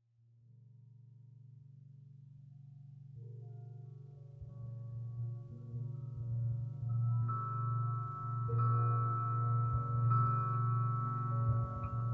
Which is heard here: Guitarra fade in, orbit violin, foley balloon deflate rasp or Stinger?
Guitarra fade in